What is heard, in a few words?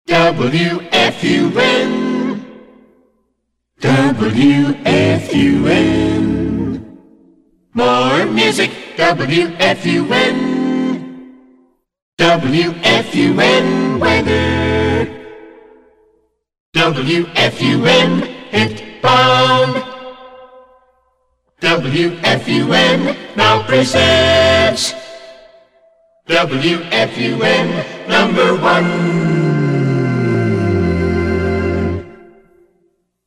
Acapella Demo; WFUN